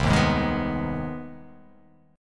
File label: C Minor Major 7th Sharp 5th C; CmM7#5 strummed; JGuitar